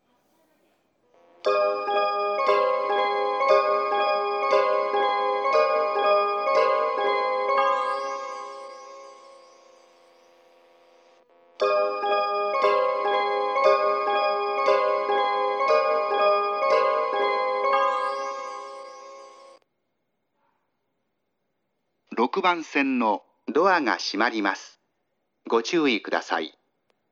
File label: kawagoe melody